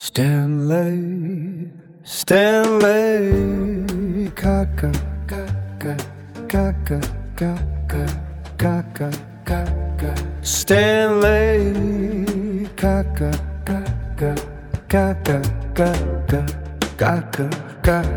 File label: jazz